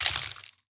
broken glass step; Golden Crayon Sounds

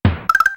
Wrap Boundary Alternate; Salem's Sound Schemes

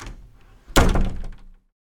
door close; Golden Crayon Sounds

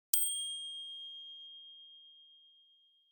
Alert Voicemail Salient Haptic; iOS Sounds

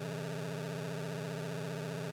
map loading; Original TK Sounds

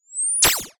missile interceptor fire dist; Original TK Sounds

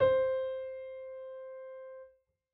piano c; Golden Crayon Sounds

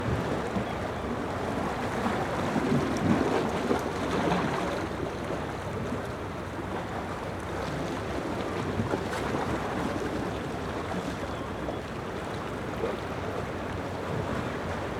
beach; Golden Crayon Sounds

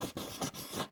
writing; Golden Crayon Sounds

